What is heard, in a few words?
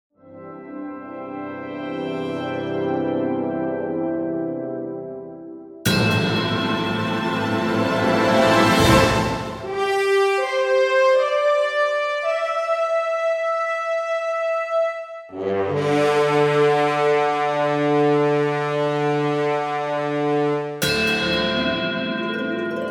Orchestra Fanfara; Orchestra Fanfara (0:31